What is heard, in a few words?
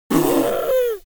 human vocal little girl snort